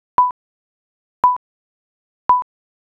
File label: ecg